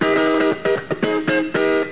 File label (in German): gitarre